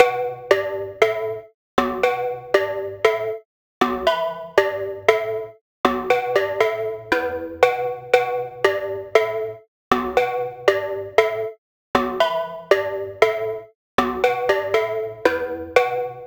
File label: key pop 118 bpm; loops; sc essentials